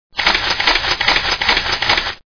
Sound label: camera